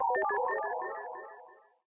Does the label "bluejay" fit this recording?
No